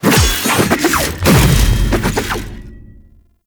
riposte polearm finisher foley; Enemies; Drifter; Warframe; Duviri; Dax

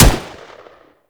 M16 Shot; Warframe; Grineer; M16 Rifle Wraith

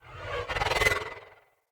Great Sword Range Rune Charge; Runes

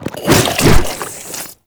Infested Ancient Strike Flesh; Warframe

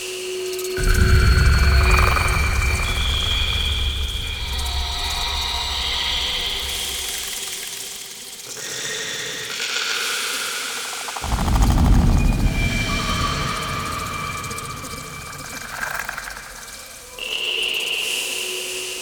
Infested Eris Interior Loop; Orokin

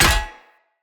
Hammer Impact Shield; Weapon Impacts; Tuvalkane Hammer; Combat

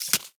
Micro Step Snow; Warframe; Corpus; Arachnoid Micro

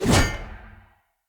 Player Sphere Polearm Whoosh Heavy; Weapons

